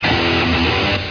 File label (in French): guitare